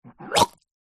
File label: human male vomit sick into bowl